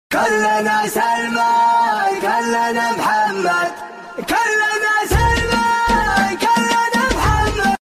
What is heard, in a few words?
goofy arab music